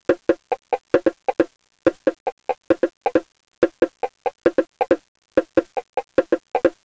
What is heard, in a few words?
bongos